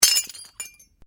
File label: China Cup Breaks; china cup breaking; Objects